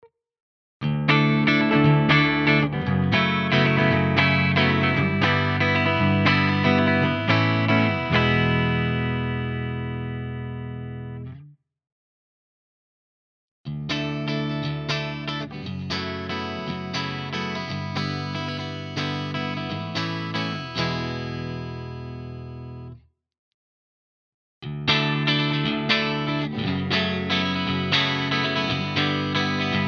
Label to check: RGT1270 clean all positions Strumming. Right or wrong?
Right